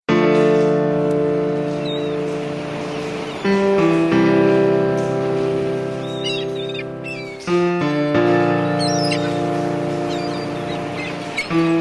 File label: Jungle